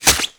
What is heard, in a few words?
Axe Shell, Damage